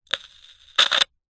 radio receiver or other receiver click hiss crackle no signal short